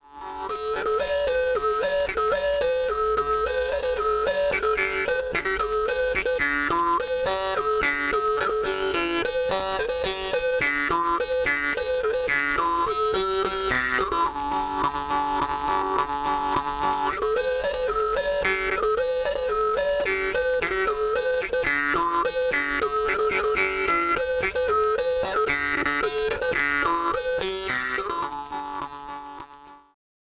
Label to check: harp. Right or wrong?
Right